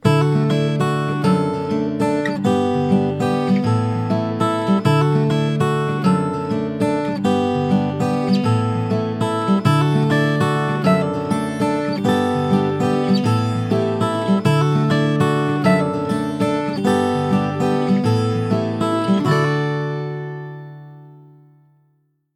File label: LG Mobile Sound